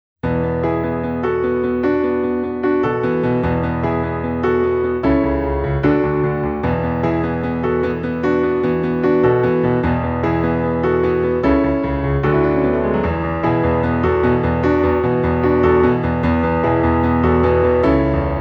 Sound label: piano; MOTHER; GALAXY II VIENNA GRAND IMPERIAL